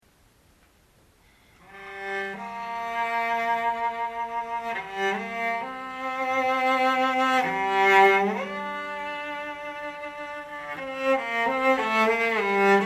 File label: improvisation